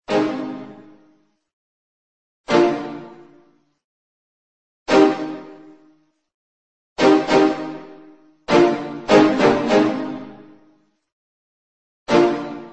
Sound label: instrumental love beats